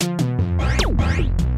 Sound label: Clockwork Machines Electro; LSD Dream Emulator; ps1 gamerip 1998